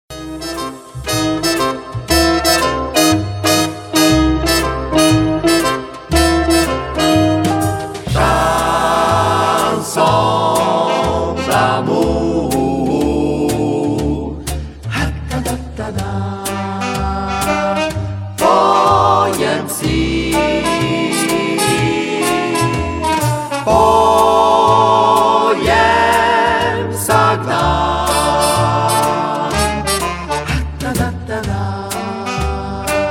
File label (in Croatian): vokalni kvintet